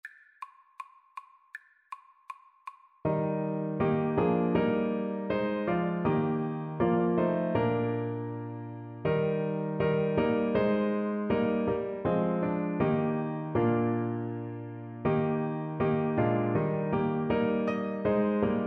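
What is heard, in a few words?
duke street, alto saxophone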